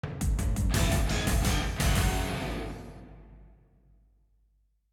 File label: Lose; PAPER MARIO Color Splash; wiiu